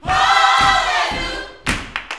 Vocal Gospel PHRASE; Spectrasonics; Vocal Planet Vol.1 Gospel

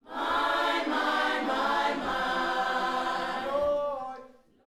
YOUTH CH PHRASE, Vocal Planet Gospel

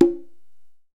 conga

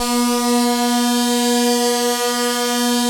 ATMO PADS; Best Service; XX Large

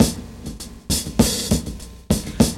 LOOP, DRUM; E-Lab; X Static Goldmine 2; akai

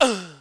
VOX

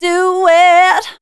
voice spectral, ly short

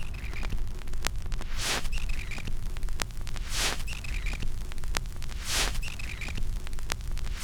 CRACK, CRACKL FX; E-Lab; X Static Goldmine 2; akai

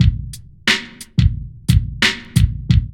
LOOP; E-Lab; X Static Goldmine 2; akai

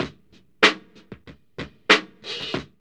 LOOP, DRUM; E-Lab; X Static Goldmine 2; akai

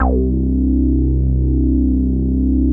BASS; ZERO-G; Datafile

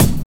BD

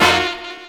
HORNHIT, HORN STAB

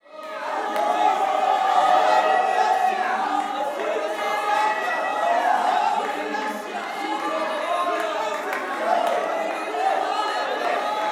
WORSHIP, Gospel; Spectrasonics; Vocal Planet Vol.1 Gospel

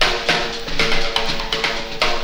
DRMROLL; E-Lab; X Static Goldmine 2; akai

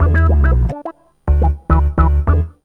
B LOOP; E-Lab; X Static Goldmine 1

